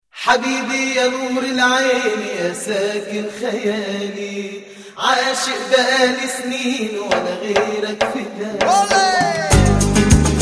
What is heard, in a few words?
ole arabic flamenco